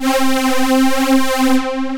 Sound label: Automotive Poly, Analog, Synth Lead; Ableton; Factory Packs; Synth Essentials